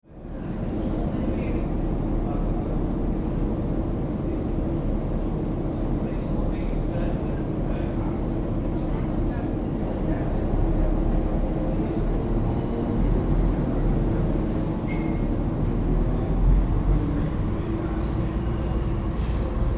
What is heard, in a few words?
Ferry Interior, riding onboard ferry in Amsterdam; sound of ferry engine and light crowd chatter; Boat, ferry; Boat Sound Effects